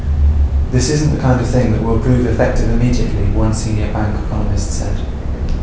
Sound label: Reverb far